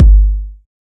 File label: tight lows kickdrum sound g key, Royality free kickdrum sound tuned to the G note. Loudest frequency: 129Hz